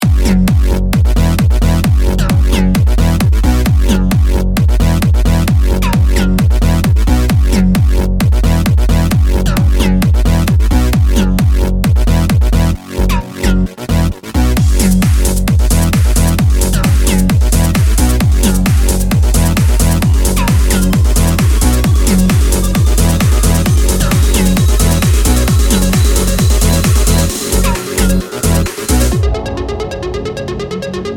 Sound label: trance collection